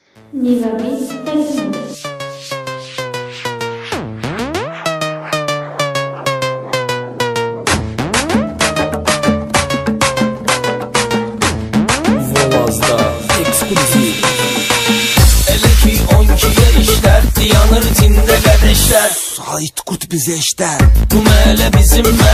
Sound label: Azeri bass music remix